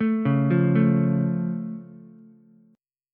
F Augmented F Ukulele; arpeggiated

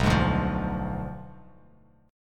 B Diminished 7th B down Bass Standard; Bdim7 strummed

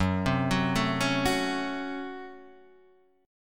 Db Major 7th Suspended 4th Sharp 5th Gb

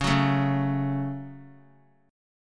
Db Suspended 4th Sharp 5th 3C,3F,3A,3D; strummed; JGuitar

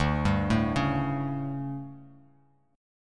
D Major 7th Suspended 4th, D, down, Bass Standard; DM7sus4; arpeggiated; JGuitar

